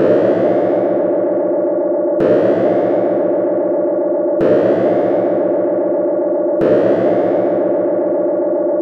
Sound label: Tape delay FB Loop; radiosonics